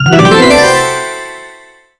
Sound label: casino